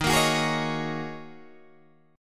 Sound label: D sharp Minor 11th D sharp Guitar Open G, chord